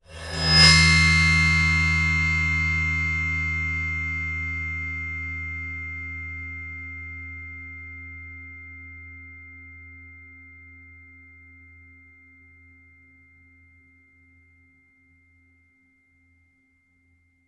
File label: bowed cymbal 13 hh highs mids lows; fienup; sonniss gdc